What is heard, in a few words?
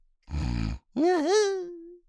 snoore; Racetrack Riches; megaboard